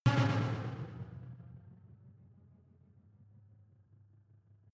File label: sci-fi vehicle engine stall; raumschiff